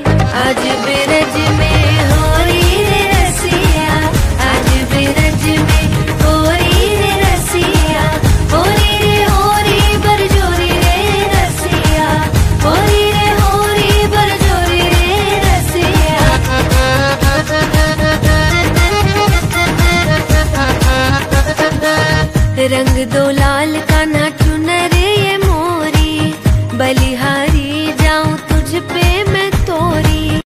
holi rasiya ringtone

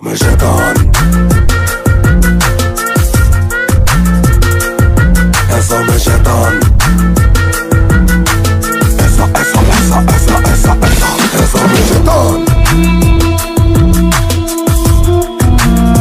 bgm ringtone

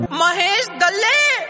message tone

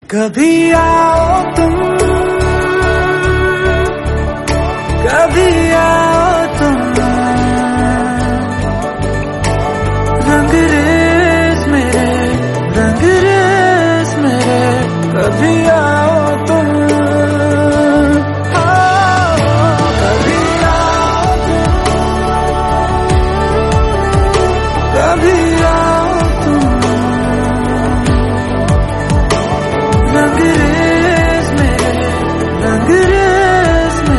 Hindi

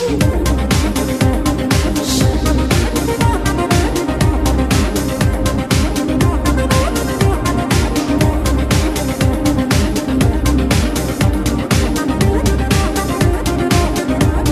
hare ram instrumental ringtone

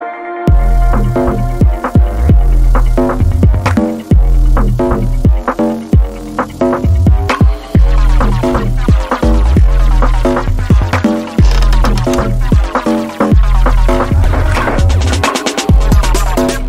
bgm ringtone

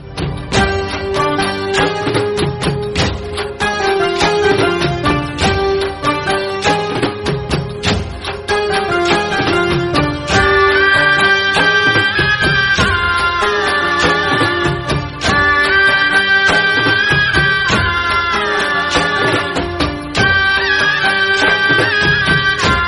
dandiya theme ringtone

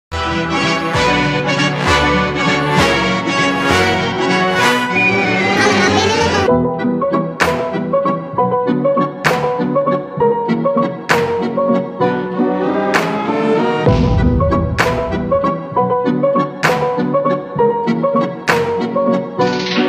bgm ringtone

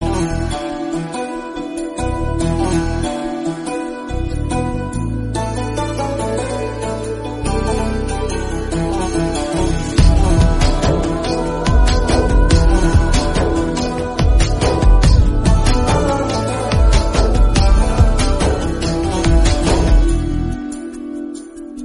Flute Ringtone

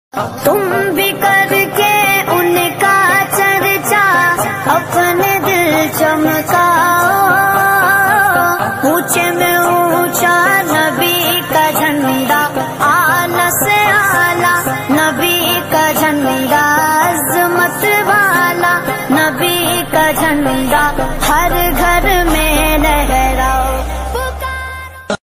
Ringtone

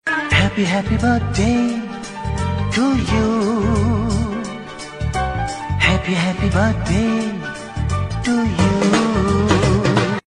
happy birthday to you ringtone

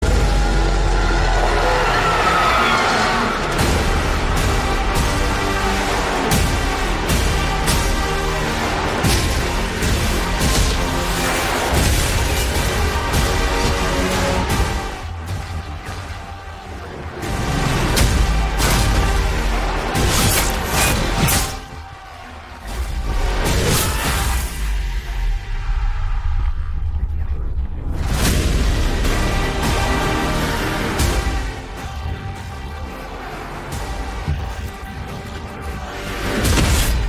trailer bgm ringtone; salaar trailer

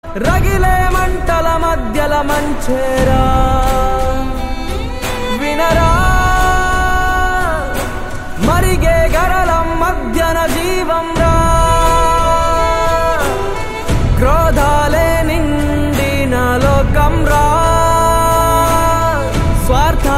song ringtone

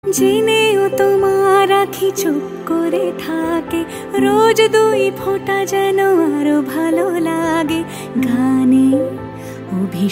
ringtone

